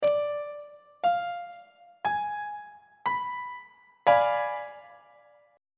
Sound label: g sharp diminished 7th 2nd inversion on treble clef